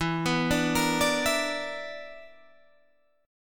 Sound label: B Minor Major 7th Double Flat 5th, chord